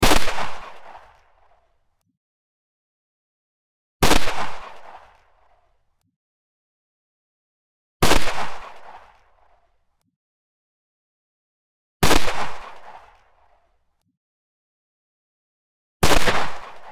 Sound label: Gunshot pistol; Sound FX